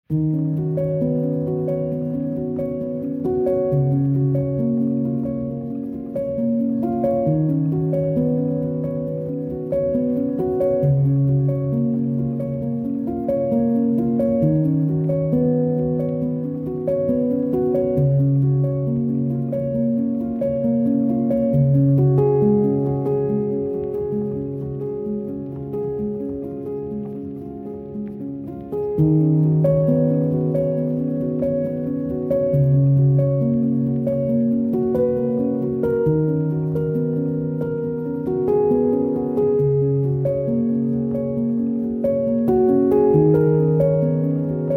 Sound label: song calm nature; dolphin tunes